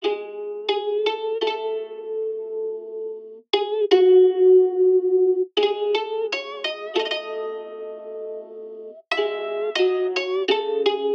Loop

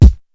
kick, Kicks

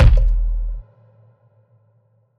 KICK

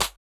Open Snares

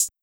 Hi-Hat

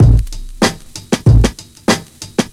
Loop

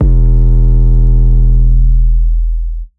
808 dirty E; 808s

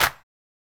Snares

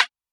rim flanged

